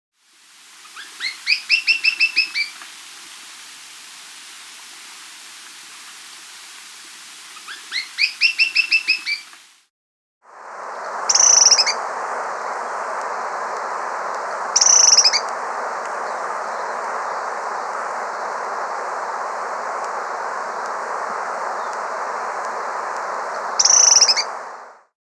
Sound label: Black-Throated Tody-Tyrant (Hemitriccus Granadensis), Andean Birds; S. America